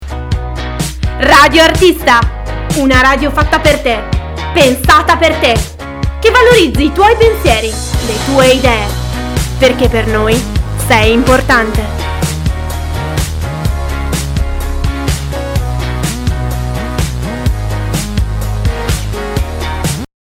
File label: Jingle